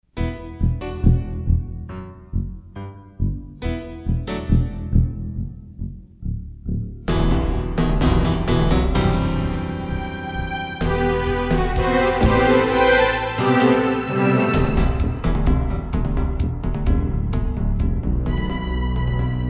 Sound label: Tension; film video